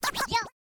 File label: cable; LG; F520L-AKA; Wooky